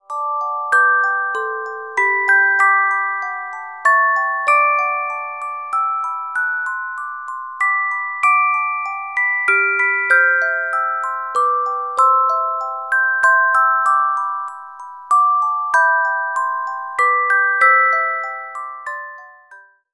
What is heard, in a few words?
Carillon Music Box Melodies